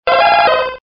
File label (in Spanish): Grito de Cubone